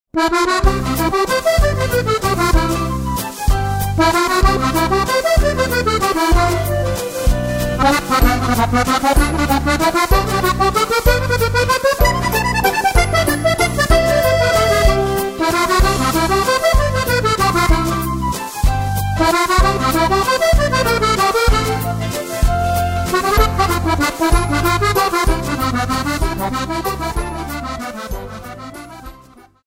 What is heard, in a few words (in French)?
trois temps musette